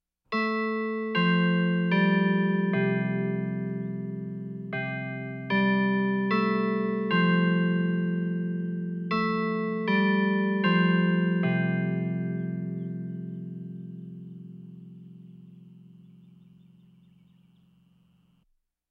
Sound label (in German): kirchturmuhr; Auf dem Land